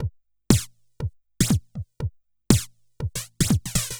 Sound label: House Drum No HH Flanger